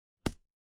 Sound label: impact punch